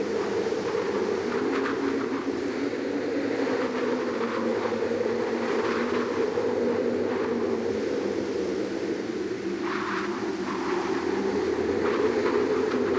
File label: Wind Light Whistling; nvidia gameworks; waveworks